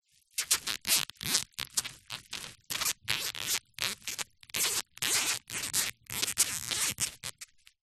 bubble wrap sheet laid flat finger hand rub across squeak